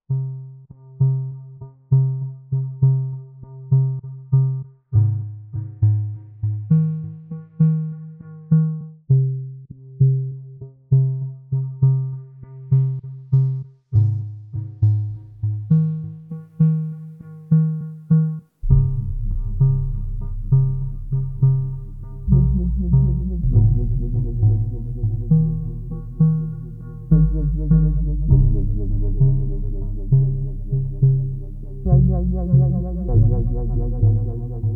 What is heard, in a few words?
spawn music; overte